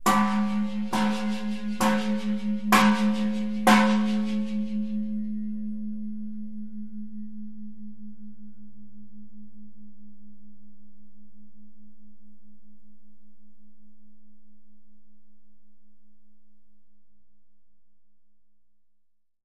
Khmer Temple Bell, bell towers

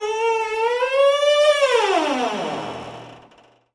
screech, squeaks

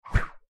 Quick Single Rope Swi, swish, rope

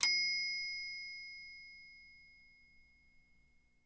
Typewriter Margin; typewriters

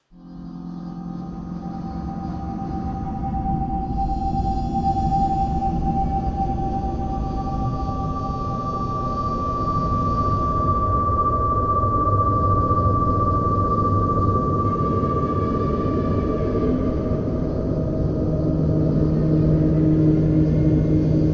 Harmonics Alternating, air

